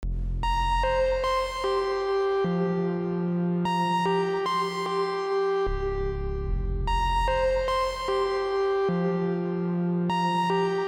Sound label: Serum Lead